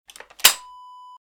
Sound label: shotgun action close